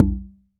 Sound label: L CONGA, CONGA